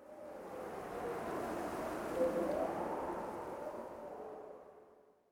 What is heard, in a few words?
terrain Ambient wind